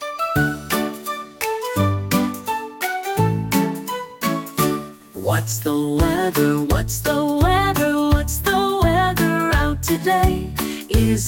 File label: weather song